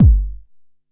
BD Ana Tight, Yamaha RM1X; Drum

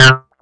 acid synth (C) squelch; Sonic Foundry (Sony Creative Software); Ugly Remnants VOL-2

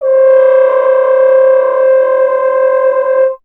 F. Horn; Miroslav Vitous; Solo Instruments VOL-2

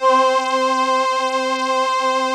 VP-330, String Machines

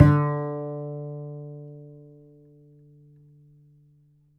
Upright Acoustic Bass, 1ST POSITION; Larry Seyer

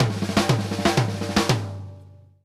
Drumworks SP fills 120; Sampleheads; New York City Drumworks VOL-1

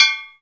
GLASS, perc; Zero-G; Funky Elements

